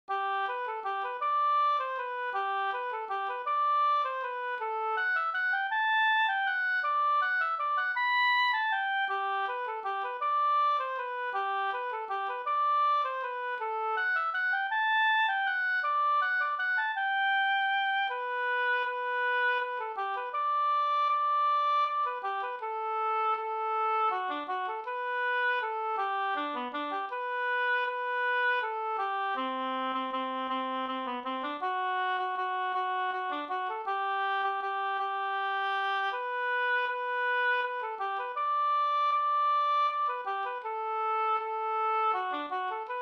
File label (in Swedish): vals Brudvals; Brudvals från Lerbo, Södermanland